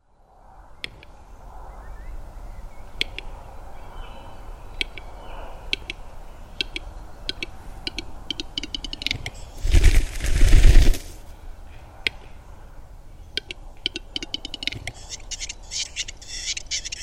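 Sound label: sounds of capercaillie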